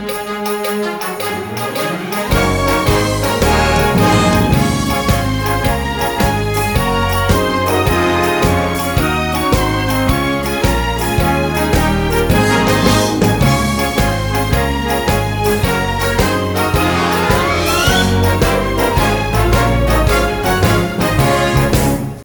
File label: Jingle Bell Medley Over The River no vocals; Sawgrass Elementary